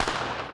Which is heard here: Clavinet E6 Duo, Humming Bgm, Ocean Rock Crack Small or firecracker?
firecracker